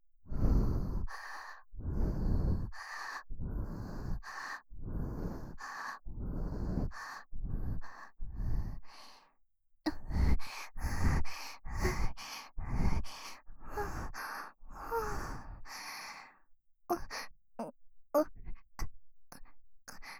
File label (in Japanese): 喘ぎ声